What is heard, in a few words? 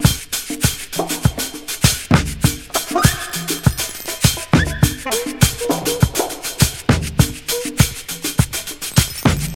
101 bpm breakbeat sample b key, Free drum loop sample - kick tuned to the B note